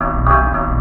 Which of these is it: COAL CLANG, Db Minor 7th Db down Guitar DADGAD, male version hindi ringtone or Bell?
COAL CLANG